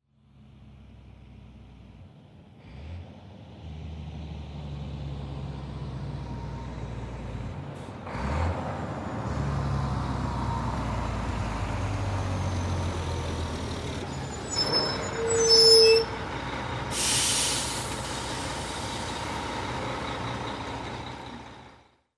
podezd i ostanovka s vizgom kolodok bolshoj dizelnoj mashiny

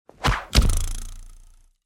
metanie so zvukom pruzhiny; Звук ножа; Звук метания ножа

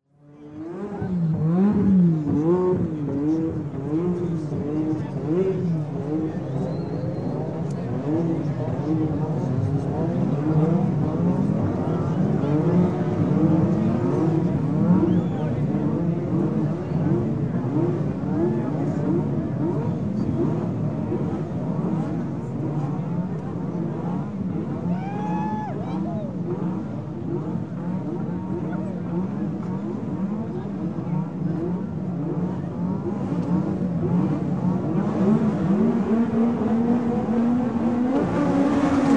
mashiny gazujut pered startom

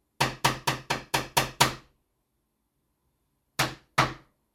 stuk vo vhodnuju kljuchom

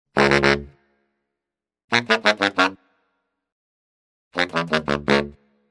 zvuk preduprezhdenija i raskajanija saksofon

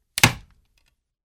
molotkom po golove

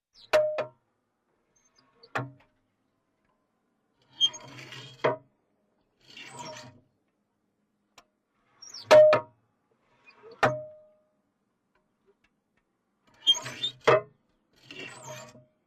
Zvuk tanka; Звук танка; Звук танка в движении, заводка, старт, остановка внутри и снаружи. Выстрелы танка

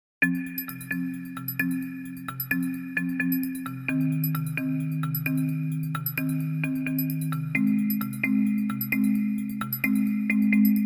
muz na ozhidanie

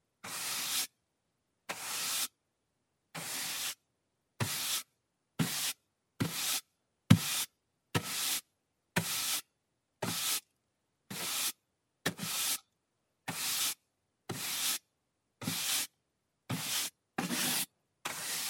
zhestkaja kistochka po derevu; Звуки кисти художника или маляра

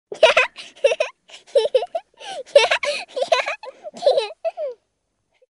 durashlivyj smeh devochki

